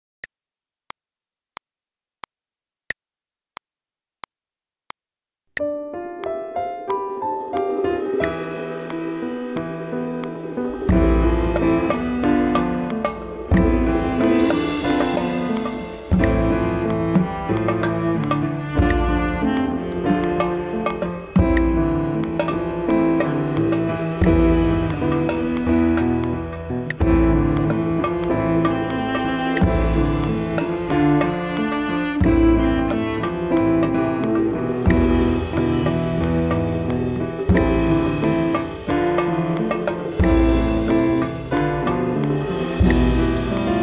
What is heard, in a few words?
Click Track; Sakura